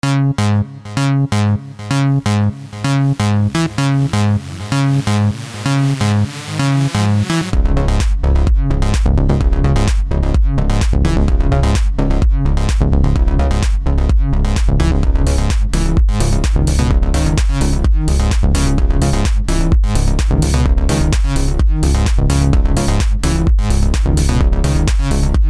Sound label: techno minimal